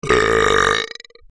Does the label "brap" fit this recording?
Yes